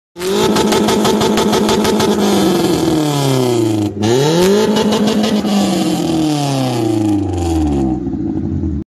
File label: L90 exhaust